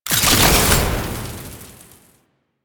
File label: Alchemy Grenade Pickup Fire; Warframe; Entrati